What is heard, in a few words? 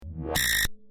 concrete